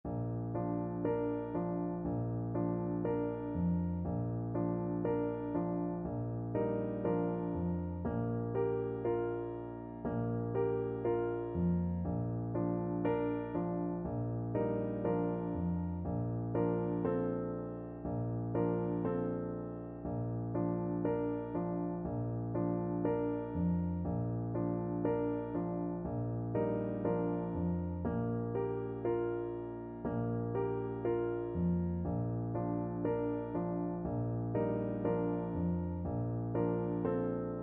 irish lullaby, Bassoon

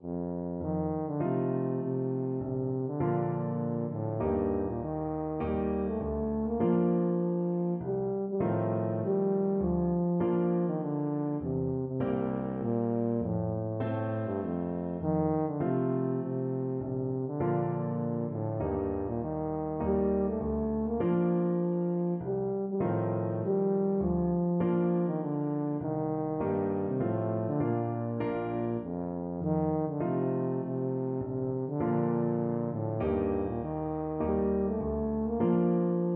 delibes coppelia, tuba

